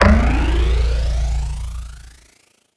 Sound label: Gasp Power Up